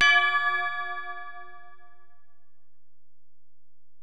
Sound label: Bell, Alesis DM-PRO